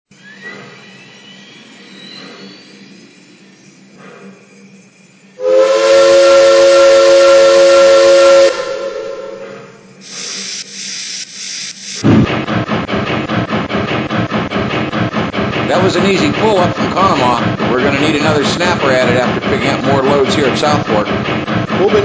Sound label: 482 M1b, trains